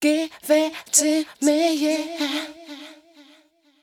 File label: VOICE GRL HOUSE 125 A, House Voice